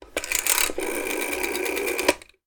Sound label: household telephone old dial 9; sound effects one